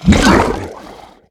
spit

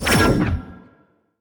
scroll Of Spaceshift teleport Out

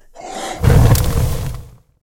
Enemy demon Fire; EnemyVeznan

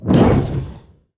heroes bonehart basic attack cast

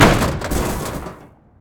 Juggernaut Death

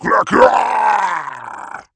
Death; Karkinos

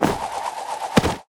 tower pandas arrival single

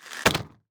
lizard shot range; crocs

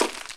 Hit Ice

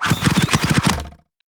arrow Storm low; heroes vesper

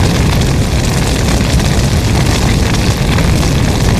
angry god flames loop

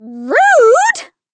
Death; Catha

